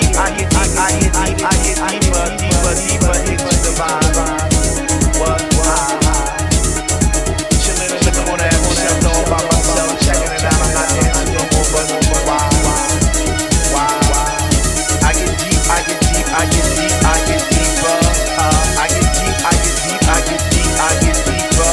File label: Vocal